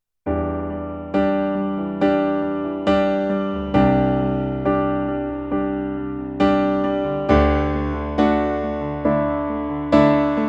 Roland FP 30X Piano

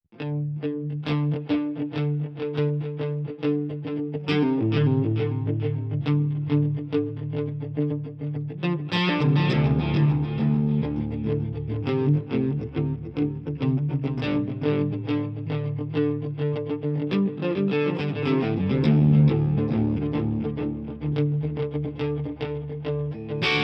Delay; Archetype Tom Morello; Brick And Wall

